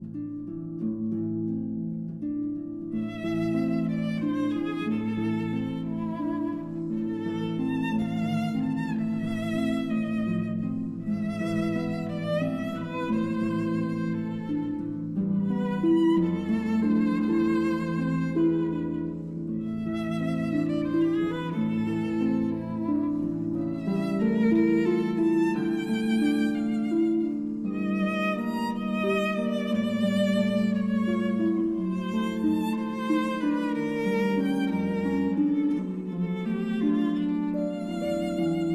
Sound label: Berceuse, for violin and harp